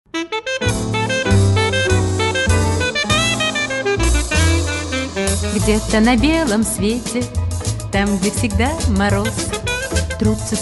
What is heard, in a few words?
russian track